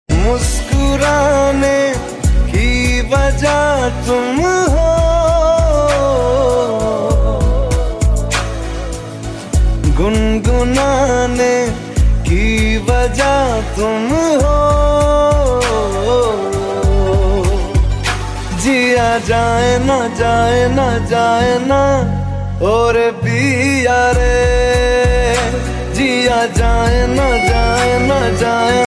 hindi ringtone, bollywood

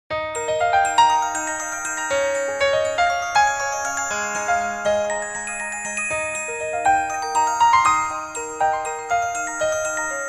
ice world piano ringtone

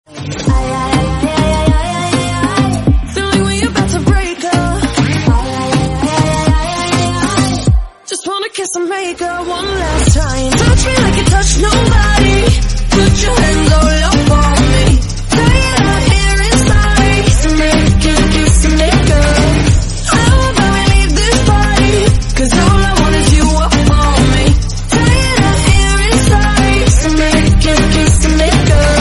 romantic song tone ringtone

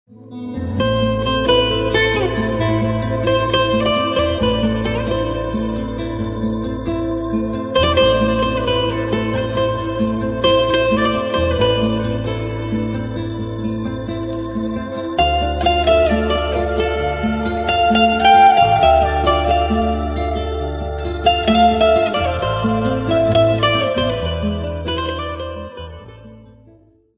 sad guitar ringtone

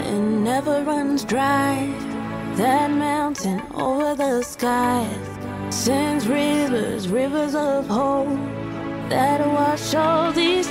new praise christian worship ringtone

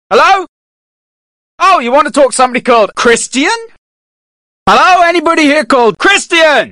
christian phone ringtone

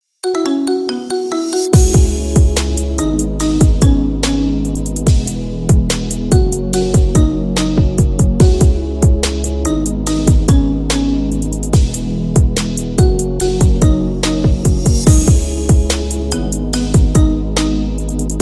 marimba remix ringtone

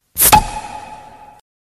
soda bottle open short message tone

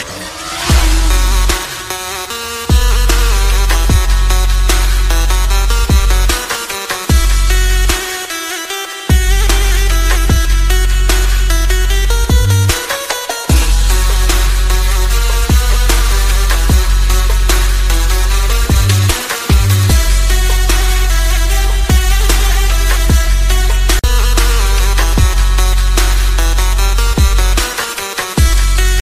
sweet english music ringtone

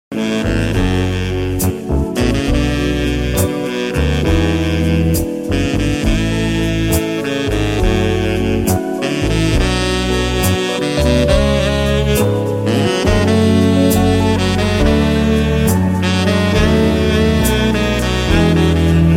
romantic sax ringtone

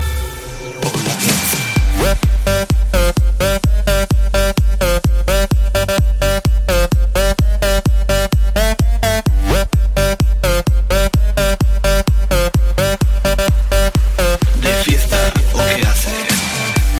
remix ringtone

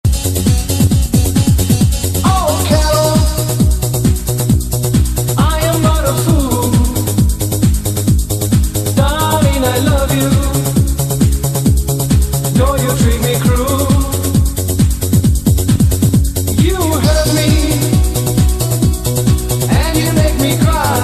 mix ringtone

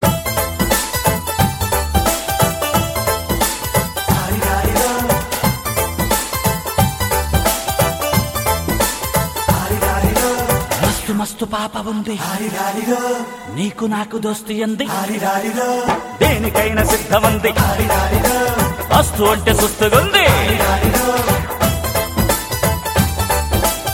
rock tone, ringtone

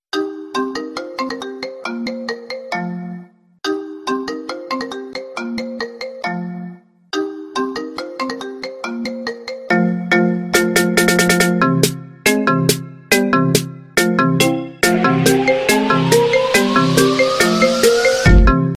iphone 7 paris remix ringtone

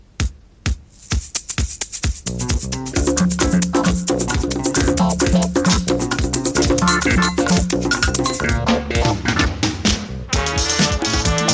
tamil ringtone

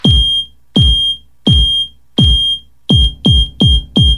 beep beep beep sms ringtone

